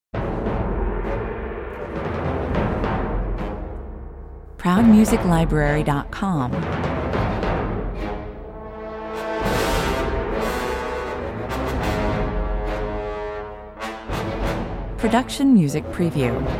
Dark, omninous, menacing orchestral soundtrack. Deep, pounding percussion, blaring horns; Dark, omninous, menacing orchestral soundtrack. Deep, pounding percussion, blaring horns and a war mongering, chanting choir of deep voices